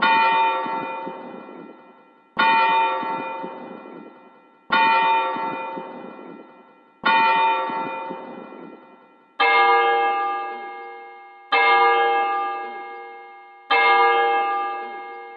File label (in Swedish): kyrkklockor